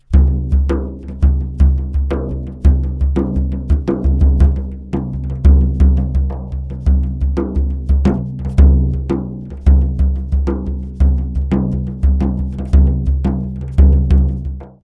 Drum Song sample

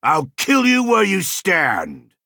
skaven pyromancer attack

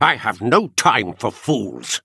dark elf sorcerer attack

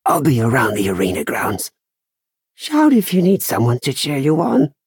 last gasp; adoring fan

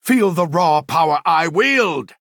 attack; indoril mage

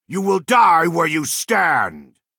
attack; skilled blacksmith

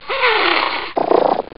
Giant Scorpion

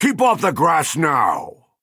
attack; gardener of swords

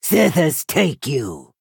attack; stormhold henchman

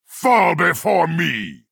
attack; gortwog gro-nagorm

